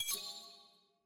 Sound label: party channel connect; premade voice